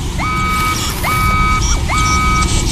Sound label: robot ship alarm